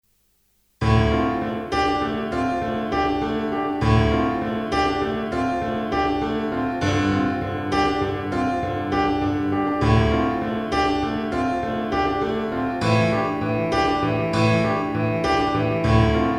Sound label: piano pop